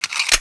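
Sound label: m4 silencer, firearms weapons; m4 silon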